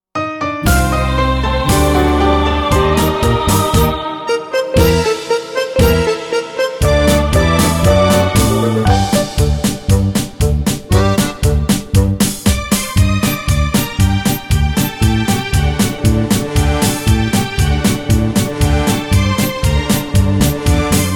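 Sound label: minus G minor alto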